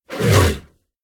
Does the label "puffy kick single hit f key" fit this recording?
No